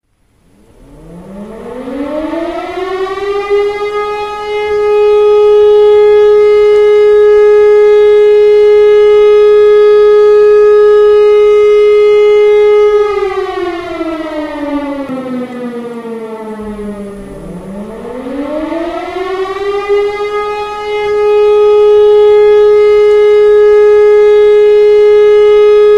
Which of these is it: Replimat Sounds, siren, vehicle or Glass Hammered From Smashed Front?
siren